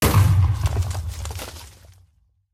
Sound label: explode; Minecraft